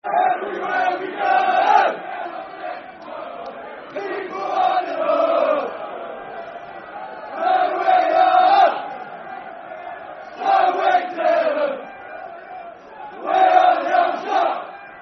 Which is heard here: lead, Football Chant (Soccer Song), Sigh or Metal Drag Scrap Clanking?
Football Chant (Soccer Song)